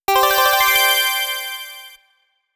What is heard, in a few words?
Jingle - Level Up; Sphere Knight